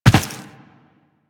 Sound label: Land Default, Footsteps; Warframe; Tenno